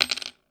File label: coins table